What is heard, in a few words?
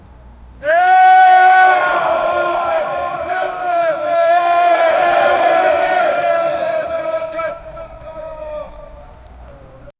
men doing a war cry in; horses